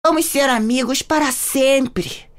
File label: huhuhhuuhu